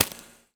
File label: Foley